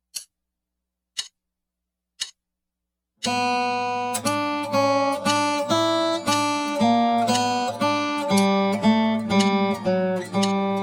pickings studies in G slow

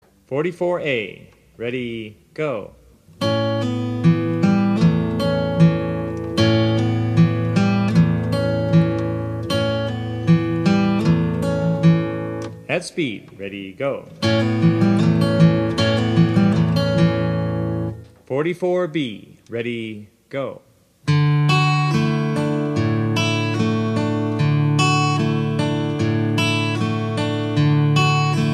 travis picking

